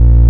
move, video games; invinco

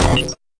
fx drum, Electro FX

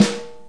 Sn A3 pp; REAL LIVE KITS

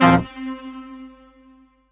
dark sax hit C7; Horns and Brass

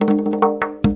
arabic perc, Arabic Percussion Kit; ETHNIC and WORLD PERCUSSION

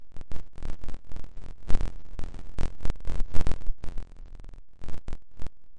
signal breaking, looping ambience

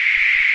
key; pulsar

